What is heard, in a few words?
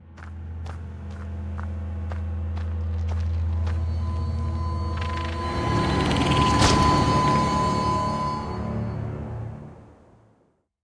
Spider Death